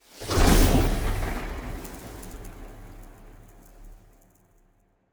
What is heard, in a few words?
ship corvette move; Stellaris; machineage; ships